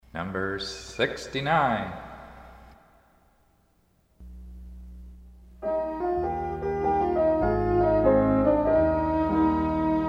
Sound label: Hymn